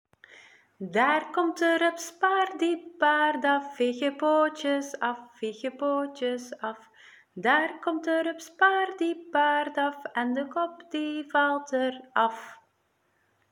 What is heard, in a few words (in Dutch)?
doeliedje pardiepardaf